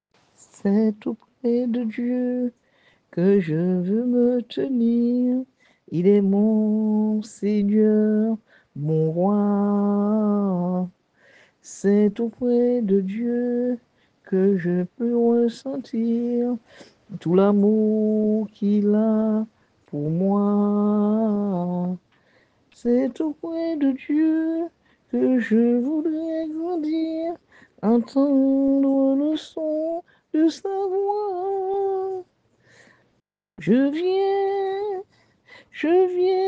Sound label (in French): Chant; c'est aupres de Dieu